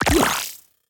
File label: Confirm Middle, User Interaction; Rescopic Sound